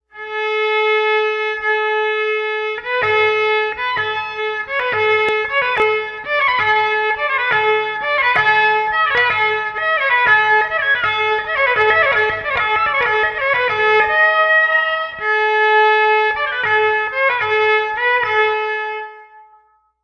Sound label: plucking or pulling off while bowing